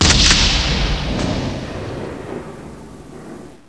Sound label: gun cock